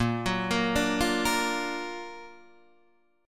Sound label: Gb Augmented Major 7th Bb